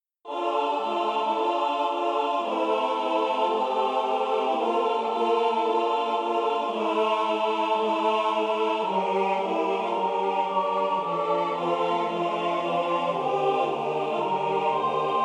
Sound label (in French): canon de la paix tutti, Chants